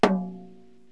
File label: med drum; drums